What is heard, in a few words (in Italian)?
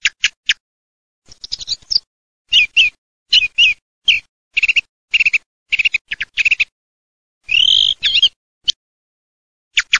TORDO